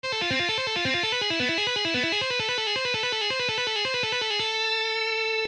Shredding Exercise

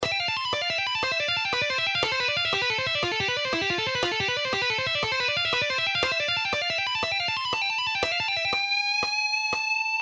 C Major or A Minor, guitar

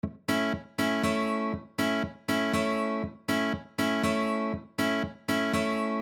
Rhythm, guitar

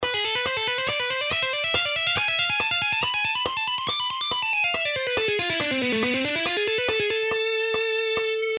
Shredding Exercise

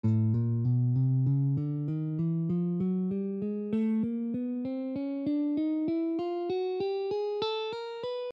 A Chromatic, guitar

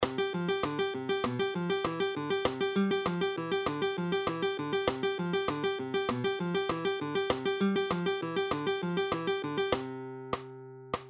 Alternating bass Fingerpicking, guitar

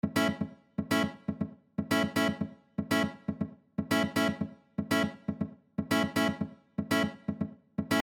Rhythm, guitar

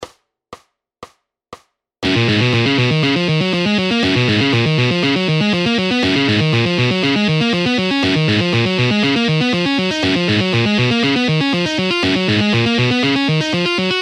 Advance Guitar Shredding

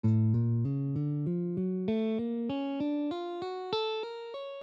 A Six Tone Symmetrical

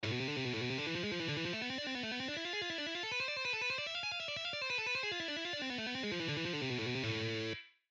Am Harmonic, guitar; Guitar tapping; Michael Romeo Style

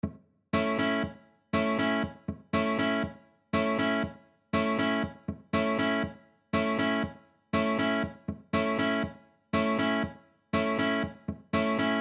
Rhythm, guitar

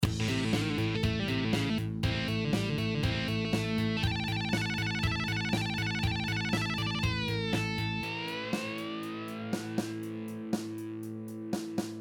guitar

